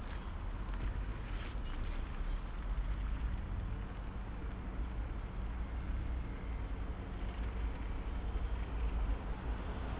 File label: noise